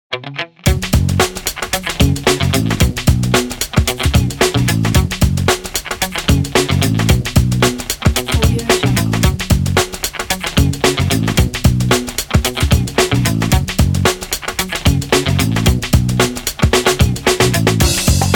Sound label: fun funk